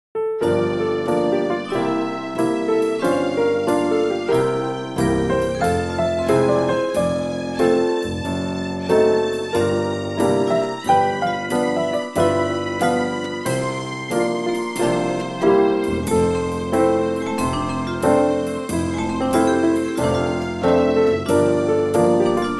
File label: Piano Strings Vibraphone; Yamaha Piano; Syntheway